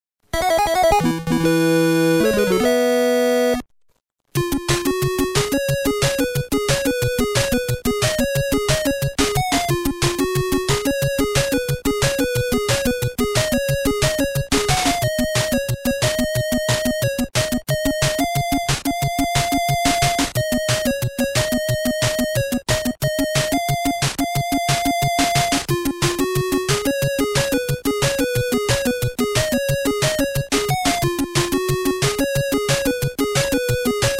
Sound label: Power-Up BGM; Konami Shooting Collection